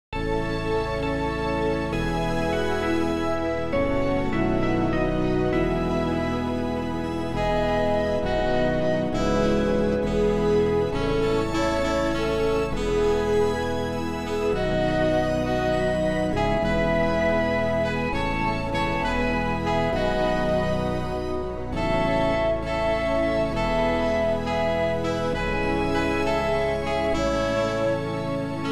instrumental; New Hope Music

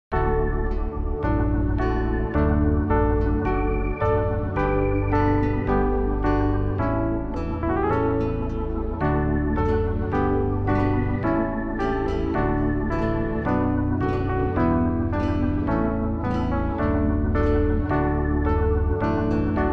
backing; New Hope Music

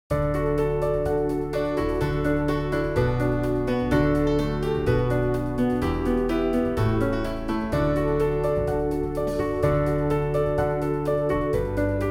backing; New Hope Music

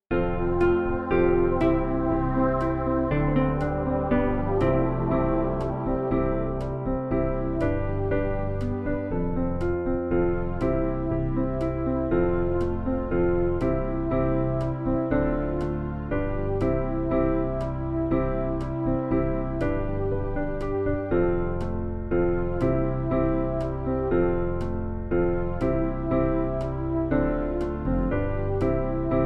backing; New Hope Music

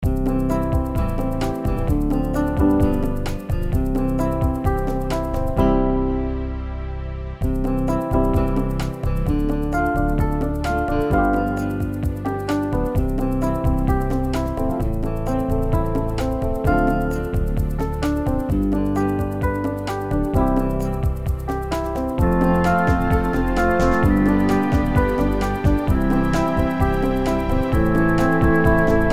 backing; New Hope Music

